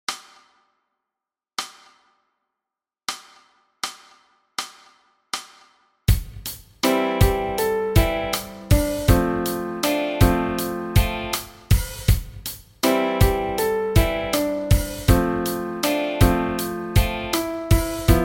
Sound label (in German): B Teil, 80, ohne Bass